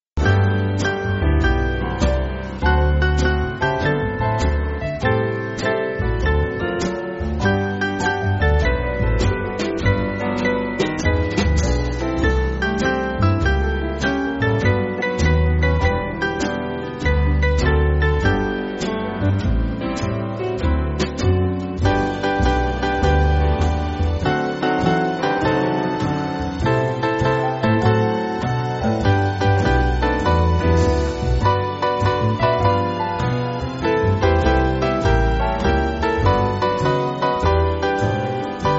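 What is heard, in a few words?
Band, Backing Files for Churches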